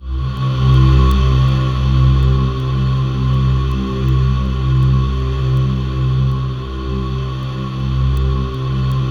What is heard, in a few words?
C2, Synth Leads